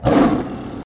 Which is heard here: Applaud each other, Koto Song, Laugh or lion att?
lion att